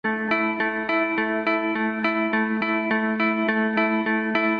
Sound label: melody